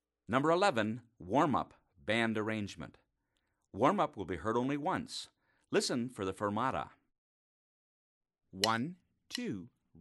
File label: warm up